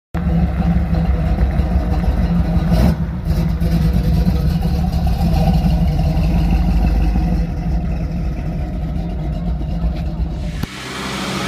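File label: Pontiac, exhaust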